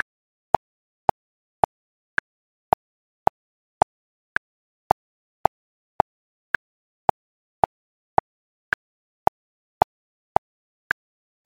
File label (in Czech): metronom 110